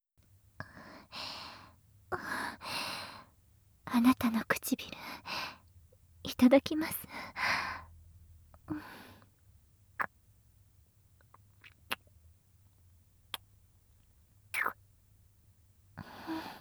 キスA(軽), ASMR; 清楚ビッチエルフ姫のメガ盛りマントロフルコース

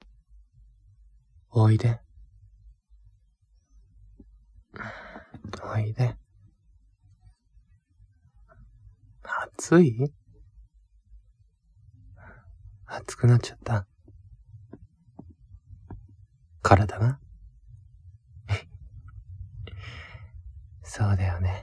優しいキスと優しいクンニ

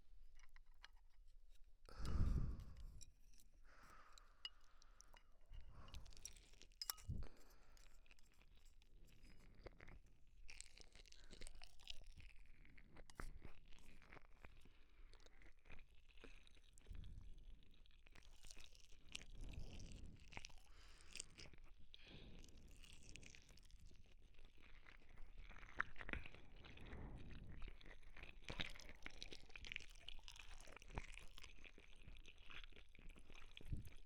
咀嚼音 両耳

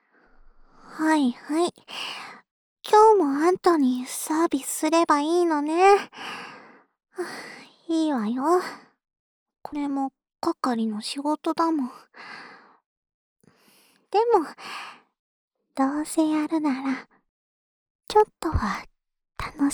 安眠用お耳ふーふーループ（右耳）, ASMR; 一週間、おまんこ係 コンプリートパック

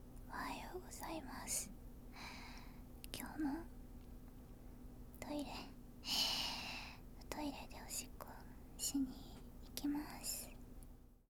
朝起きておしっこへ; 少女の寝起きおしっこ一番搾り; バイノーラル; 寝起きおしっこ11日分

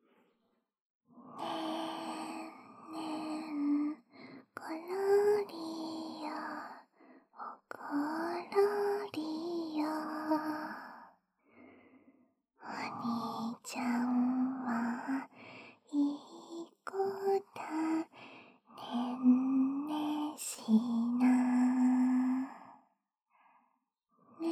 ひたすら子守唄

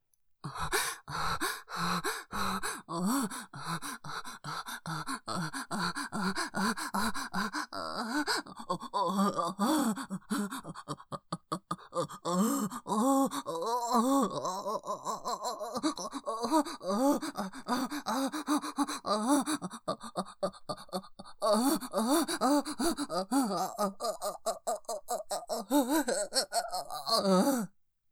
おほ声; シスターが祓う穢れ

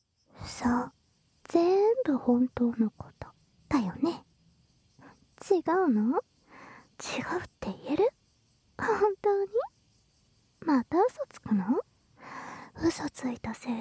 反転 舌で落書き落とし; 巫女のお姉ちゃんは本当に処女?～おちん〇んで処女膜探しするけど気持ちよすぎてすぐ負けちゃう～

